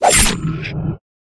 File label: necromant attack